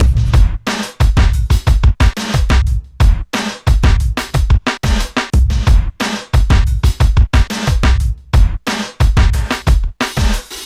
punch up 090bpm; Zero-G; Downbeat Dance Grooves

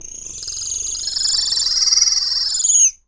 bird call

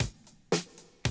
Classic Drum Loops

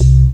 DM BAS, Dance Mega Synth

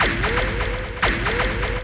hit, IMPACTS & HITS; Techno Trance Essentials

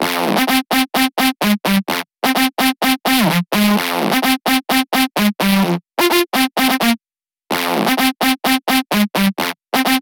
128 BPM lead Synth Dry noSC, G; Vengeance; Essential Clubsounds Vol.5; Demo Constr Kits; Sidewalk Kit

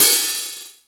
Rock N Roll, Drum Machines

